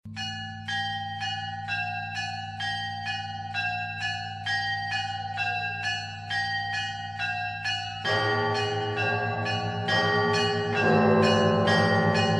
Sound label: Lavra bells